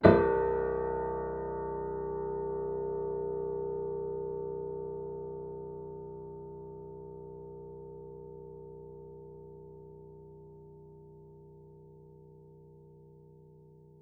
Piano Harmonics, Harm Low Mid

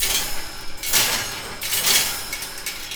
Hitting, Workshops

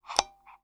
Taxi Meter

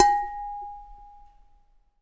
Living Room Large, Lampshades

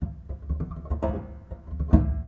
Knock Erratic, Piano; Piano Miscellaneous

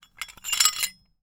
Hole Saw Rattle, Hole Saw Bits

